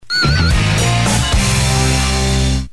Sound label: rock radio